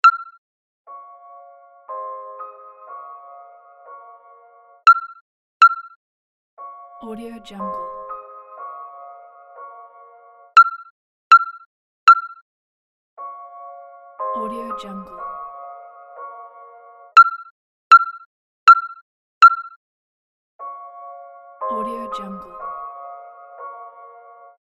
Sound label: alarm clock